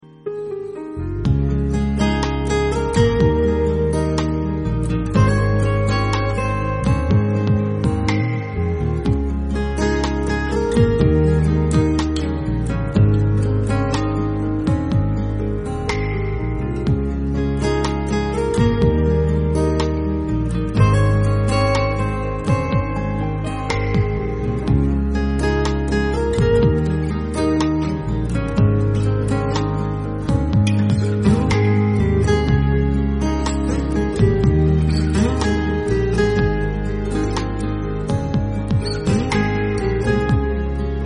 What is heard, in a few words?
Guitar Cover